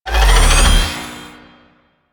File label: Mech Sword Heavy Charge; Nechro